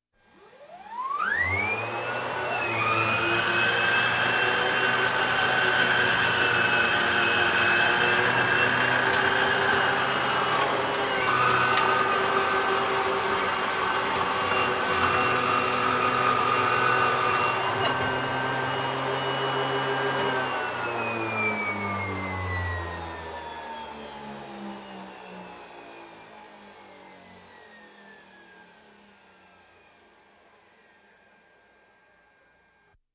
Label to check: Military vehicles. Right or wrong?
Right